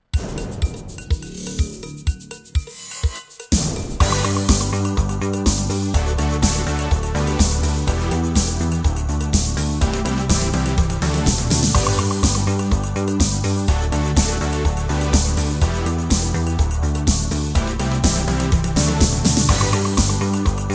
pop shop

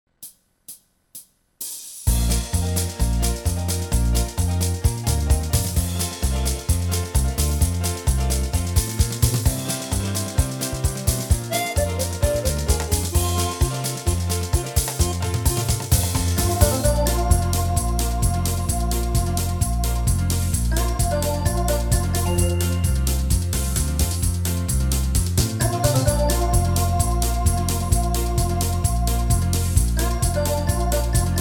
country

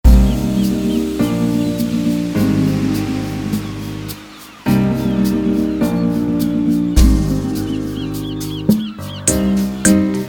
california bossa

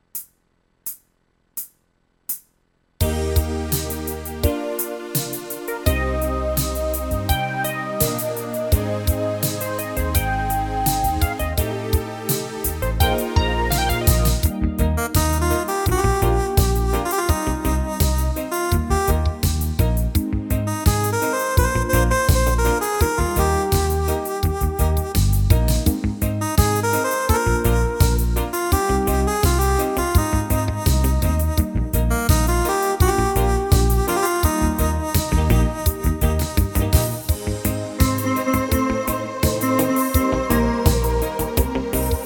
reggae

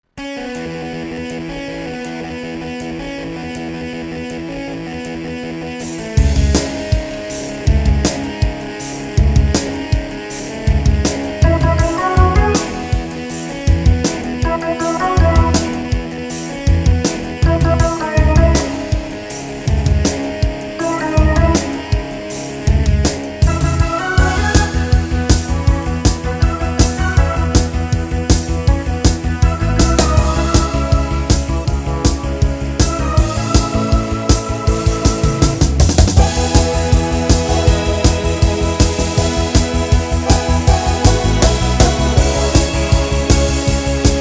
rock

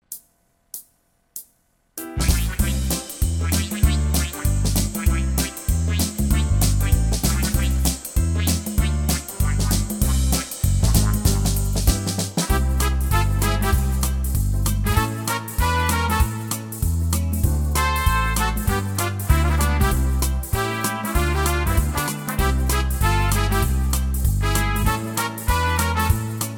quickstep

